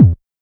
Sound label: Quasimidi 309, Drums